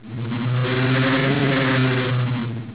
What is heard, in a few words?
alien noise